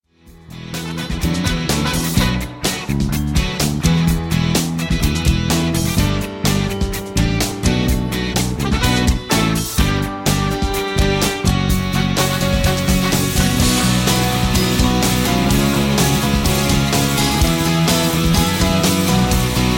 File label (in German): daheim playback